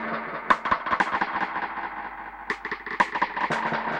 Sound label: Dub Drums B Echo Snare 120bpm; musicradar